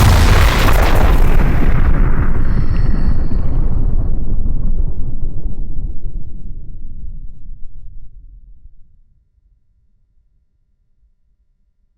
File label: Nuclear explosion; Hovl Studio; SFX Battle sounds; WhiteMan Unity2D